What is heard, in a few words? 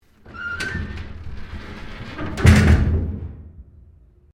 BRIDGE DECK HATCH CLOSE, Sounds onboard HMAS Castlemaine